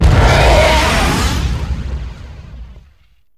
boom; tie

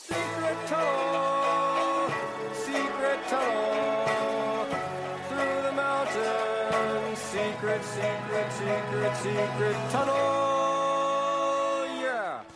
song

